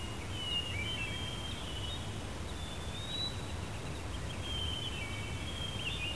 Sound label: song; vchat; Homespace